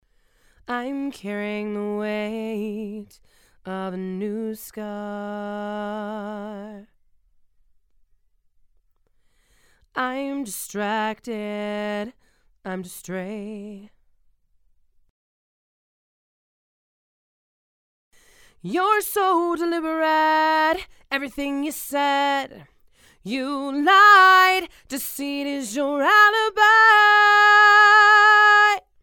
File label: Female Vocals